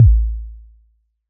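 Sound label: bd clean, bass drum; niner; hyperfocus dsp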